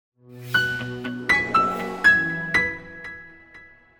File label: sky, loops